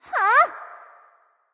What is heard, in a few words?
nv confused; cupid